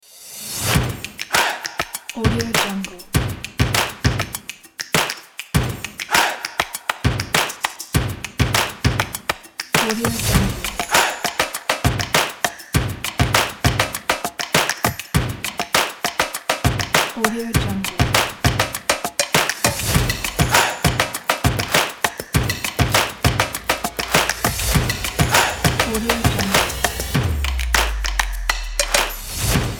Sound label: percussion